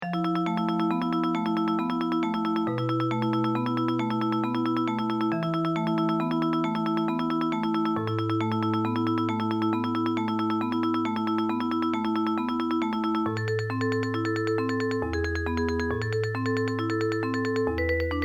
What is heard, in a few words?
tremolo pieces tremolo of autumn